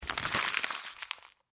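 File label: crumpling paper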